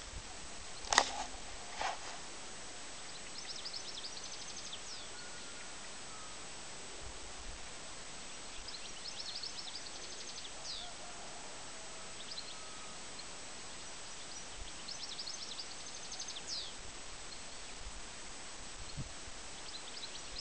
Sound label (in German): Vogelgezwitscher und Hahnenkraehen; O-Ton